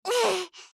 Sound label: Knocked Out; Juno